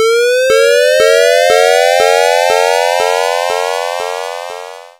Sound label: siren